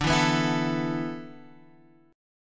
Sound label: E 7th Suspended 4th